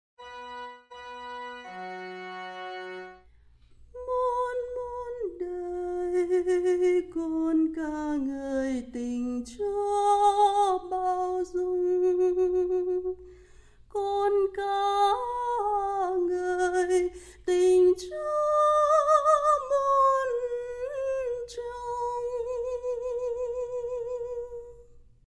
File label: organ